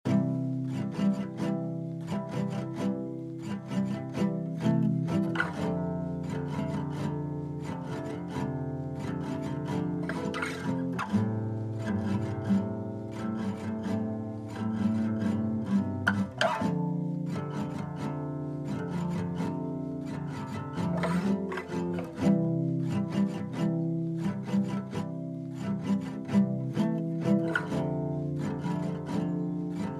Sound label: 130bpm C major